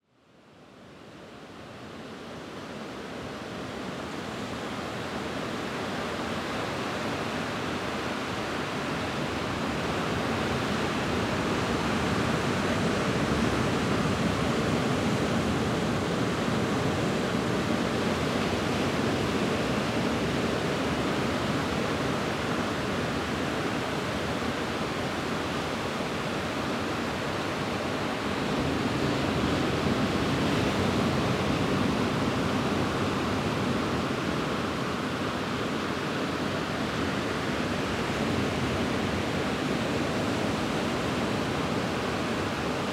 Wild Pacific Ocean Waves Colombia Choco, powerful waves crash upon a secluded sandy beach; Jan Brelih; Wind Is the Original Radio